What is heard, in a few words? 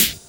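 Closed Hi-Hat, Dance Drums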